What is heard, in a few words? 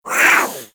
Catbrow Feral Vocal Attack Short; Enemies; Warframe